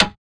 Rim

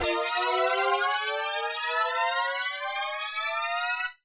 TRAP TRANSITIONZ

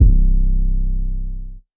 808; Spinz1

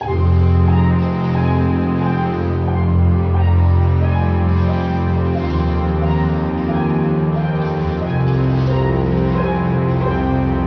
Loop

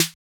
Open Snares

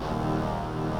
Brass

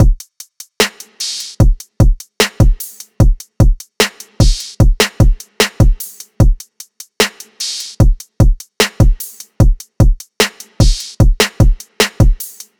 Full Drum Loop 150 BPM; Cymatics; Astro Gazing

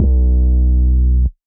Not Nice 808's

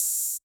Open Hats

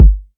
808's

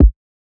KICK

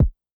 Not Nice Kicks

